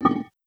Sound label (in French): plaque d egout fermee